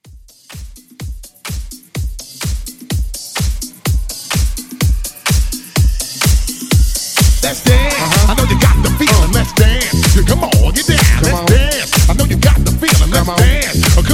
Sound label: Dance Mashup 126